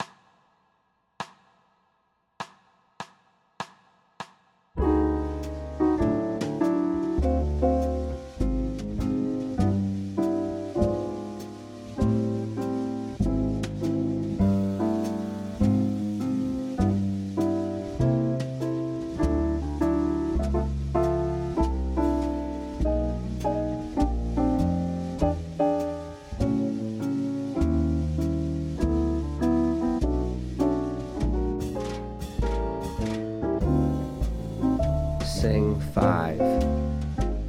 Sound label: D Major Blues Alto Straight; Applied Jazz Ear Training; Practice Perfect; 12 Bar Blues Ear Training in Real Time